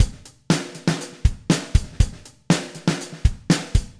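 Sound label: Groovy music Drum Loop; ps vita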